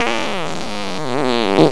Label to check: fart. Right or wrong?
Right